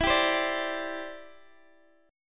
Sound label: E Minor 7th Sharp 5th E down Guitar DADGAD; Em7#5; strummed